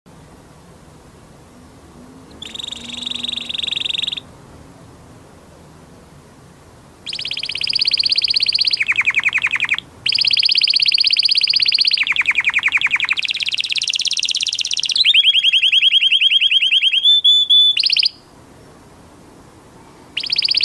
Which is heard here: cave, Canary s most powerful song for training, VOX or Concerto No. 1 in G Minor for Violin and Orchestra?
Canary s most powerful song for training